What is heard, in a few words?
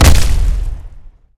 hit; cataclysm-dda soundpack; jcsoundpack; Medieval Stuff